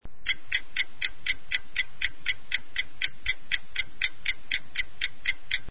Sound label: CLOCK